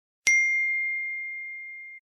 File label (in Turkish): iphone mesaj sesi tin